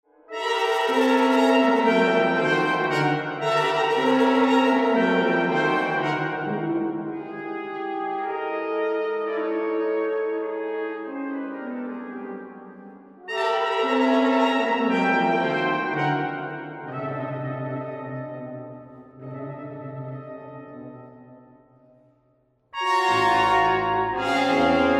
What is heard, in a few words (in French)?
Prelude pour quintette de cuivre